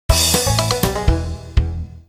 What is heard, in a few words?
ball drain, Pinball; Pure Pinball